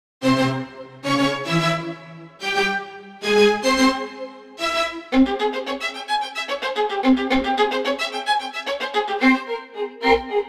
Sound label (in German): Zigeuner Orchester; 44 sekondes tzigane orchestre